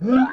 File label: ghoul attack